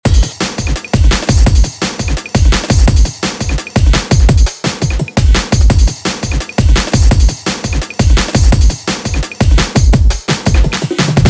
DnB; drum; beat; Drum Blaster: The Ultimate Beat Generator, Sampler Plugin for Modern Producers

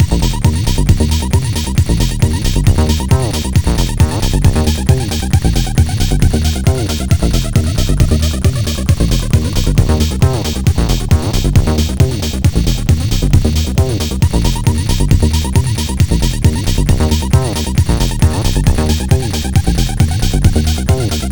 Blaster Fx Bass, acid; 100 acid vol 1

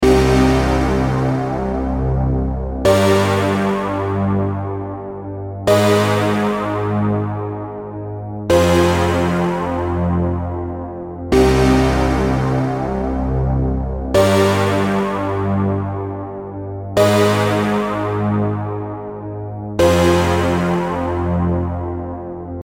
Chord Eightieser, analogue stab

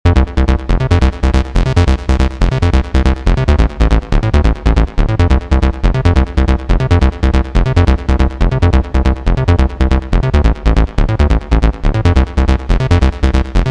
Smooth Tranced; abx3 trance presets vol3

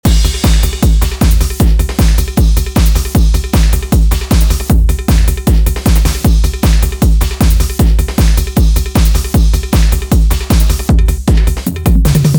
Techno; Drum Blaster: The Ultimate Beat Generator, Sampler Plugin for Modern Producers

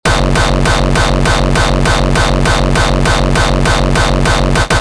Impressionistic Orchestra; kickoorator

